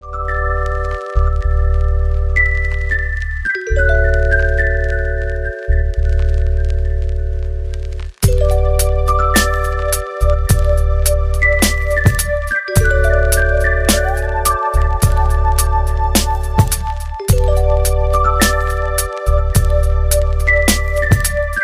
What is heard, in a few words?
dreamy vibes ringtone